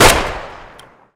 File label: Benelli M4; weapon